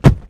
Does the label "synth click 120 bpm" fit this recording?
No